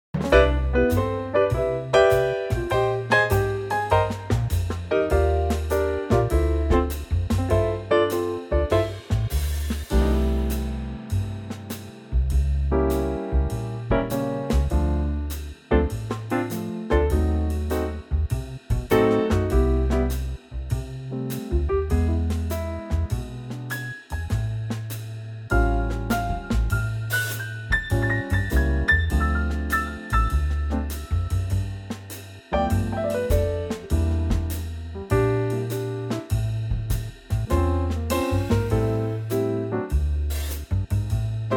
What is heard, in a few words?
C trio; key - C - vocal range - F to A